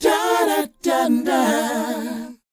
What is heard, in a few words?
DOWOP F, Vocal R&B DOO WOP; ILIO; Vocal Planet